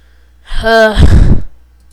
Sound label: sigh